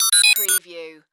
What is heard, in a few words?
Data Musical Readout; sound-effects-bible